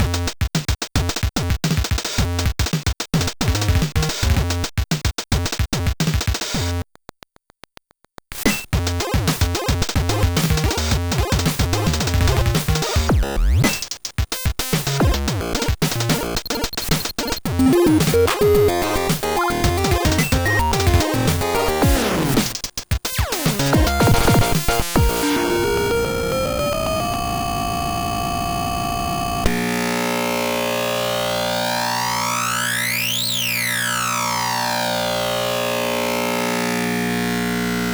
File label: sndtest dmg; gbsoundcomparison